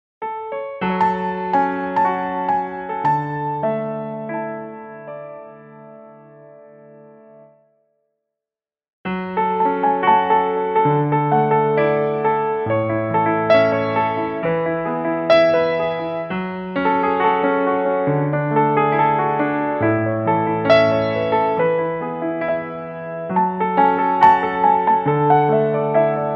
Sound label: piano